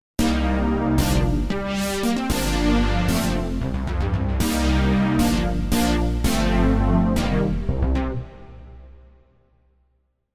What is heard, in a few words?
Synth